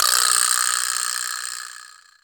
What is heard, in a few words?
vibraslap; Cuba